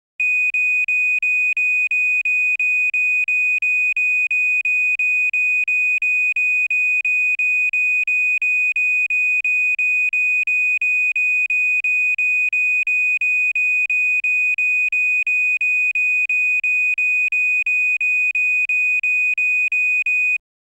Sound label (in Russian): Pozharnaya trevoga